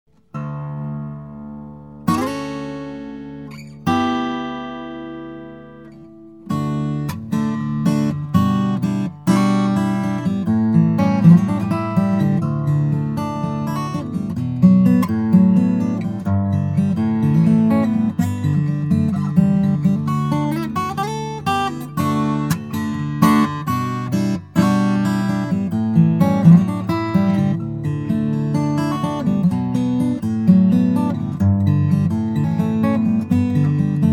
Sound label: Guitar